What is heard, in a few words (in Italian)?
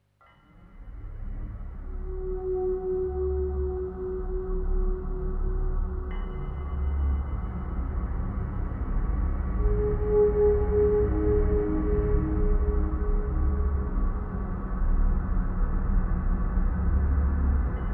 Rilassamento Armonico musicale Coro vegetale